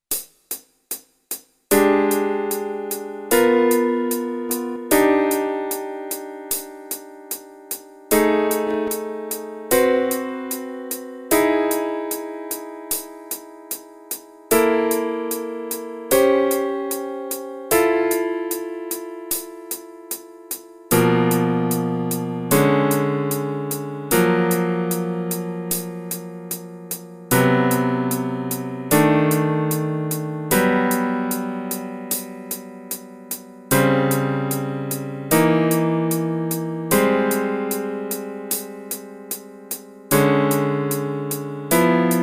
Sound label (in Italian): fisarmonica accordion, ii v i minore; Pillole di jazz… I Voicings – Lezione n. 5